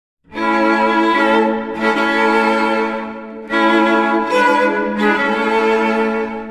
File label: Musique de mariage Wedding music